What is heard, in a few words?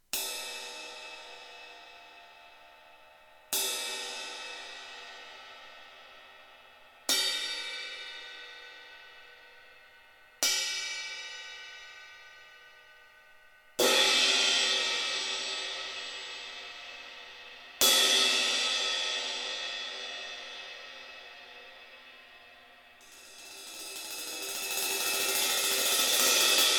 Classic Crash Dark 19; crash bekken; Amedia